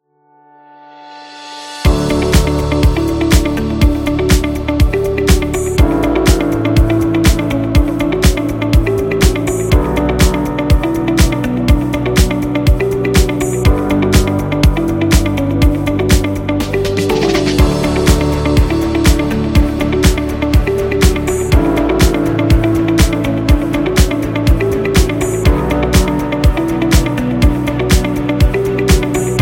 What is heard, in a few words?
An Educational